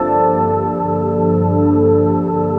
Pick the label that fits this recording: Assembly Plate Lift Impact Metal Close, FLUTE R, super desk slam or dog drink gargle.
FLUTE R